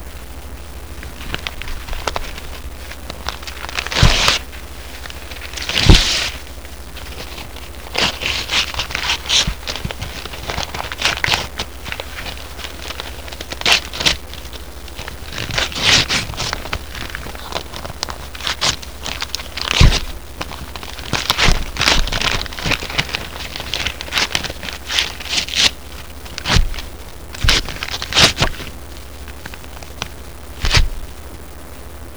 TEARING

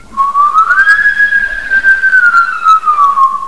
EERIE

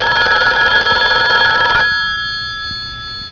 RING, OFFICE

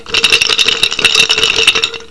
SHAKING

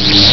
laser; MARATHON

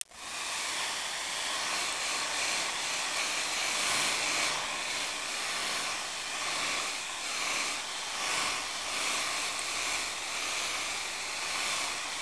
HAIRDRY

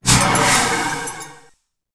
flame; KRONDOR; COMBAT

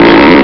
stretchs

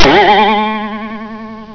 BOING, CARTOON

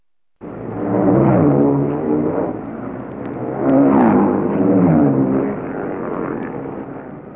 RACECAR

